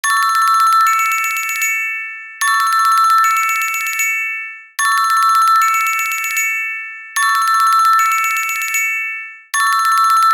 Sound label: Xylophone, ringtones